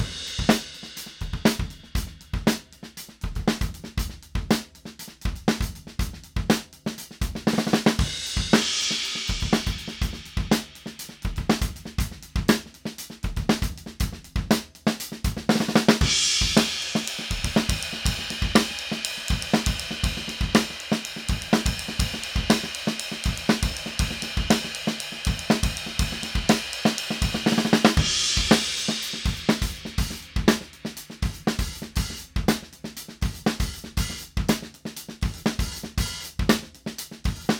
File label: drum sample